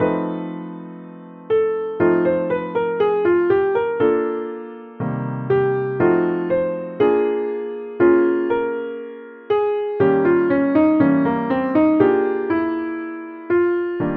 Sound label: 12 bars Piano